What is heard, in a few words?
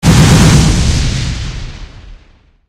barrage sfx